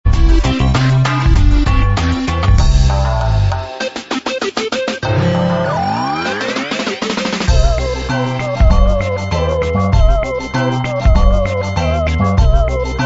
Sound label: Rhythms of Reggae Town